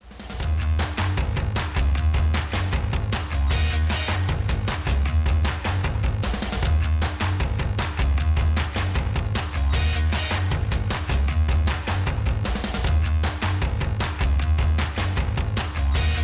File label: intro background; Ben 10 - Heatblast Fight